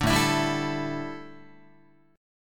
A 7th Suspended 4th, A, down, Guitar

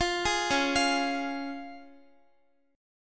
Db Major Db Guitar; arpeggiated; x,x,15,13,13,12

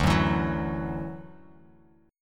D-Major Add 9th D down Bass Standard; strummed; JGuitar

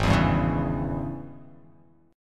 Ab Major 7th Suspended 4th Sharp 5th; strummed; 4,7,5,6,4,x,x,x; AbM7sus4#5; JGuitar

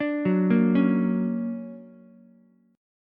D Augmented Major 7th, down, Ukulele; D+M7 arpeggiated; JGuitar

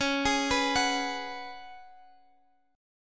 Db 7th Suspended 4th Db down; Db7sus4; arpeggiated

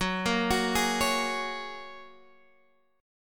G sharp 7th Suspended 2nd Suspended 4th F sharp

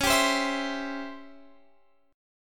C sharp Minor Major 7th Flat 5th Guitar Open F; C#mM7b5 strummed; x,20,19,19,19,19; JGuitar

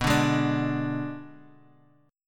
G sharp Diminished B

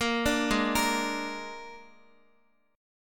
Ab-Suspended 2nd Flat 5th, Guitar, chord; x,x,8,7,8,5; 4E,4A,5D,5G,5C,6F; arpeggiated; JGuitar

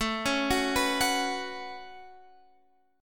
A 6th Add 9th A down Guitar Drop D

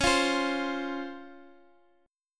C sharp Major 7th C sharp, Guitar Open F; strummed; x,x,x,8,8,7

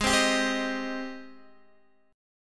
Ab Major 7th Ab down Guitar DADGAD

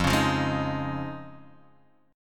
F Minor 7th Sharp 5th

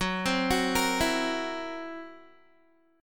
Fsharp 7th Flat 5th down Guitar; F#7b5 arpeggiated

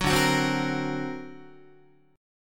D Augmented 7th D down Guitar

